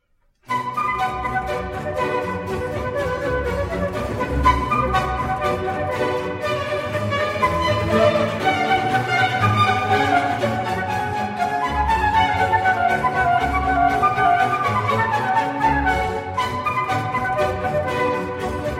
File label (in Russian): Музыкальная Шутка (Оркестровая Сюита 2 Си-Минор); Johann Sebastian Bach; BWV 1067